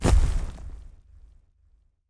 Level Editor Remove

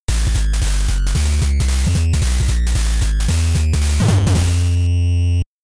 Dogbass; famitale 2nd anniversary

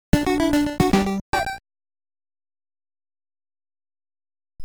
Cutscene Jingle; Kyattou Ninden Teyandee; NES

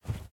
Cloth Run; Devour

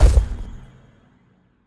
Level Editor Object Place Stone

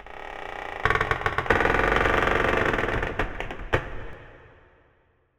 Spook

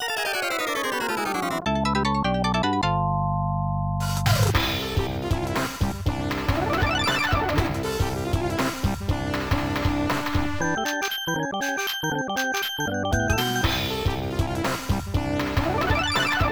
Boss BGM; pulirula; f2 system arcade; Cliff Failure Version

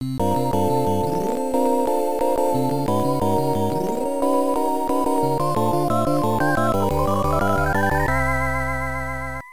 Final Race Goal; F-1 Dream; TurboGrafx-16

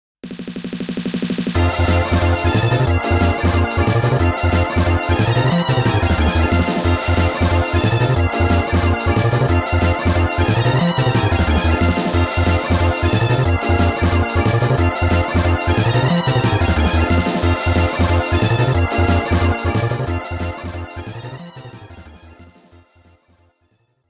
Last Boss Roaring BGM; Namco Classic Collection Vol.2; arcade soundtrack

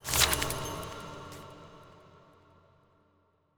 tutorial open

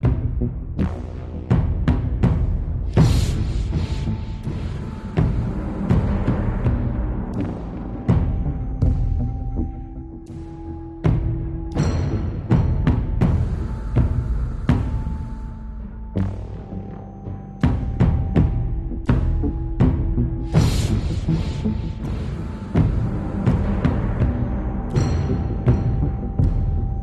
Loop Emotional Tense

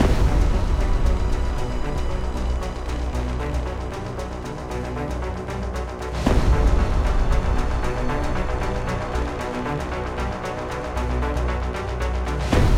Combat Music; XCOM Enemy Within

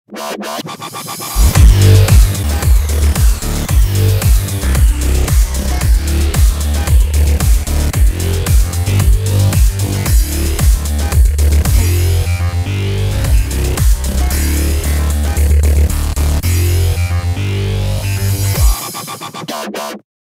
Dub

